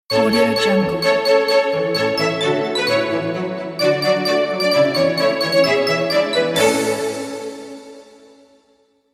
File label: jingle bells logo